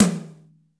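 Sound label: tom, funk kits; Crypton - DrumBase5000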